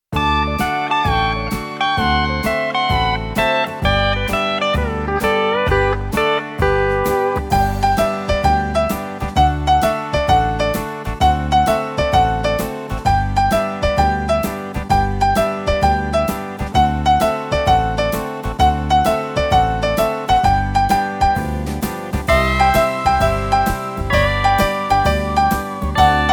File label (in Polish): owocowy koszyk wersja instrumentalna